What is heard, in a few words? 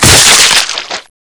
head burst; svencoop; perro